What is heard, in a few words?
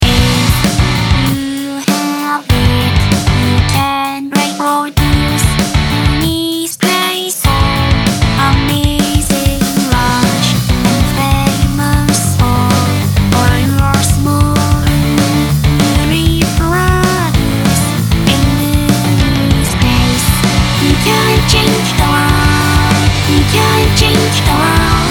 drums